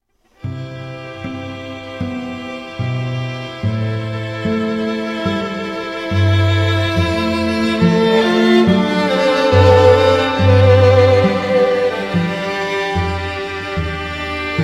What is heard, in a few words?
Strings